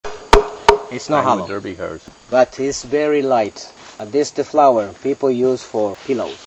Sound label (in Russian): baraban; peru; amazon; indians